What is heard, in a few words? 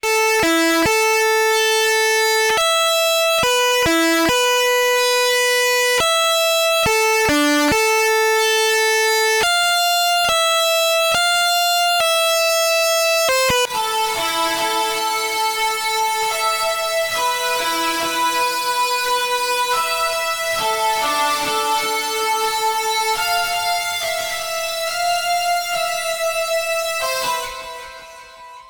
midibox sid moog guitar